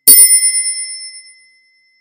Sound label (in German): Fahrradklingel Schmuckstueck